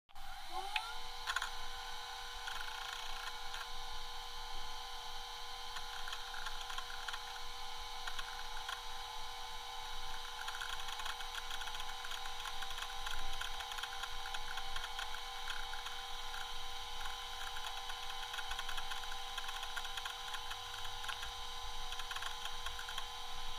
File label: Laptop turning on, fan noise, shutting down; computer